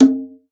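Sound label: FINGER BONGOS; Xsample VOL-9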